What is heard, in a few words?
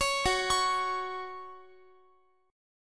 F sharp 5th, down, Guitar; arpeggiated; F#5; 4E,4A,5D,5G,5C,6F